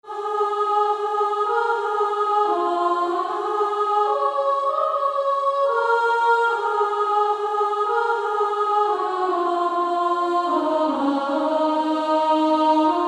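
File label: Female Choir, soprano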